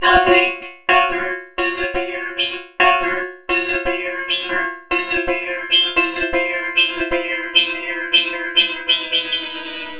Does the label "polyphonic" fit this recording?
Yes